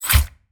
Nasty Knife Stab

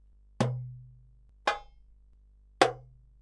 Basic Strokes Darbuka

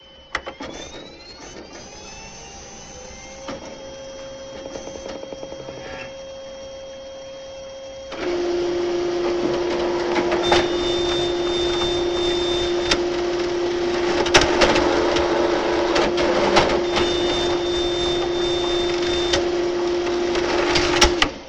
Copier

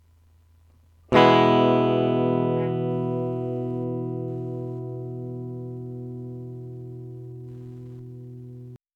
E Major

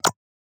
Ui Hollow Click; Series A

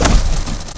Light Crate Smash

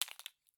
Peanut Drop

